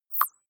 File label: click synthetic squeak bright, signale